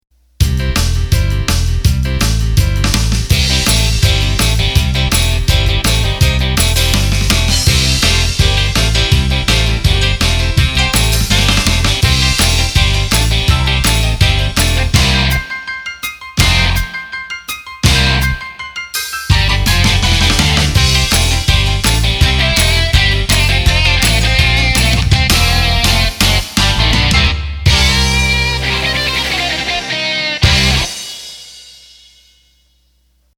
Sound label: Rock; roland bk7m